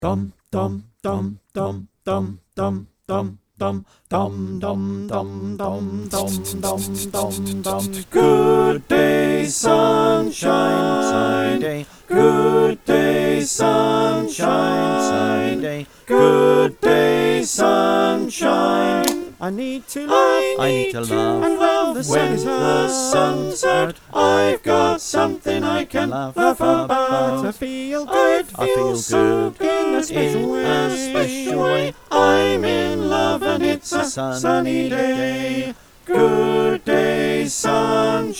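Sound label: soprano alto tenor bass, choir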